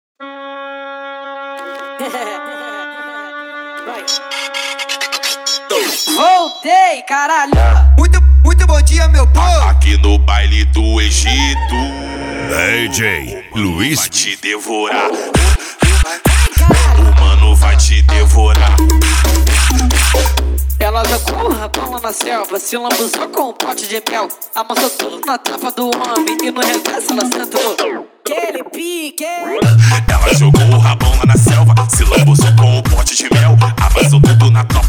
funk automotivo

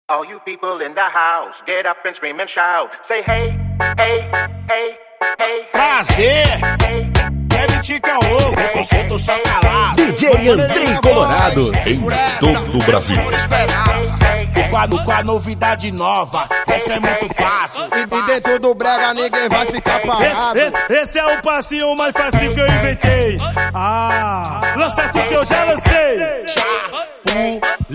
baile funk

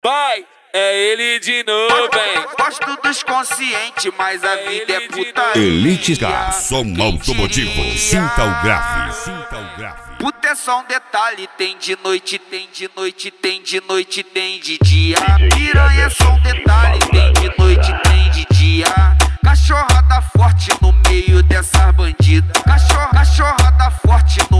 som automotivo; elite car

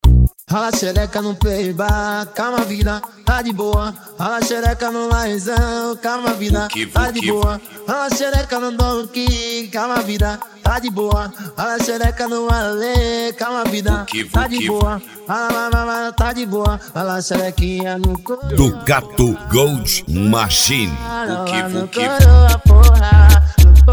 especial funk

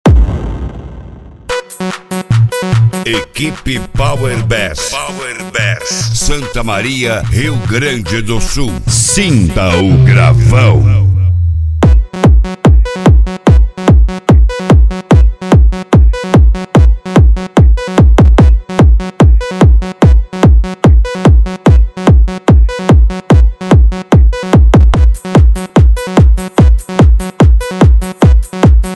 entubacao

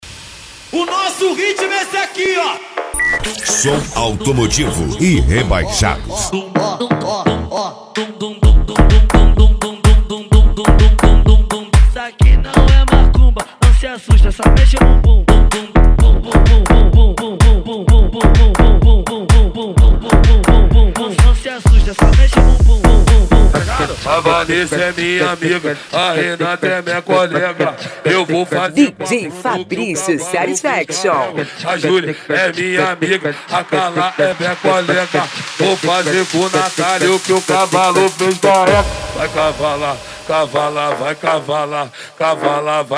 som automotivo; rebaixados

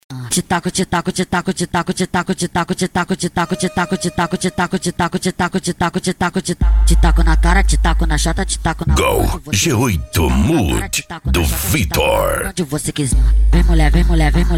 gol g8 mud especial funk ritmado

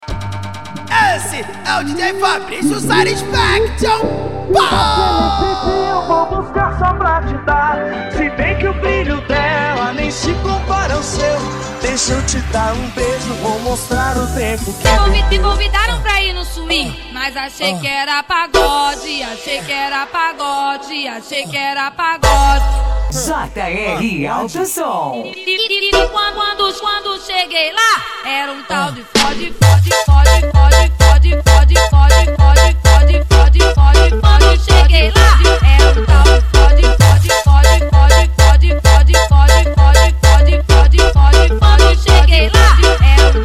carretinha mamba negra esp funk ritmado; jr auto som

